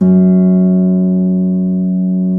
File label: Harp F#1; Digital Sound Factory Virtuoso